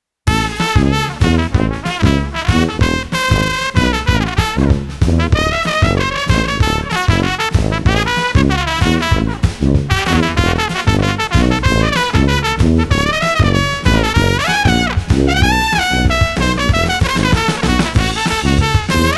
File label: Street Brass Bouncy 95